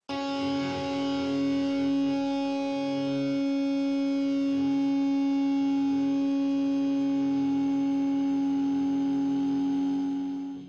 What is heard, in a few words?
heavy harmonic tune up 4th, guitar